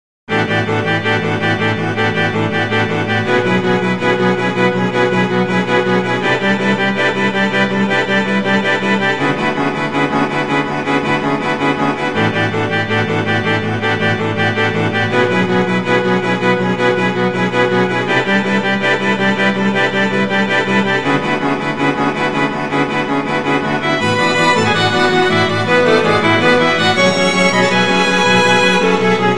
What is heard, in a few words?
refrain quartet